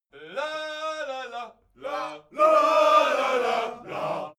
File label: army song segment; militaer